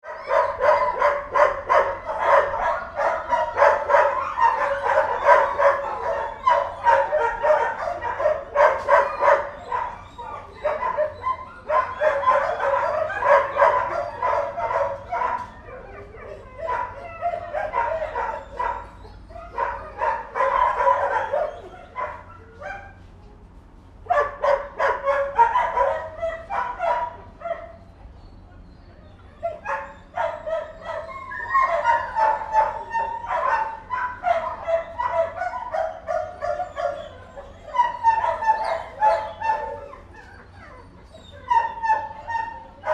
Dogs Barking 3, Greyhounds cooped in shed about 12 dogs barking and whining; Animals; Tom Hutchings